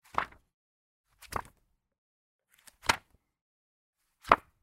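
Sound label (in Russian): звук переворачивания бумажных страниц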